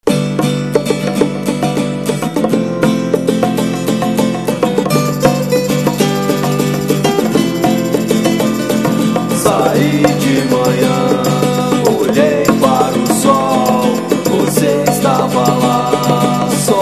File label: trumpet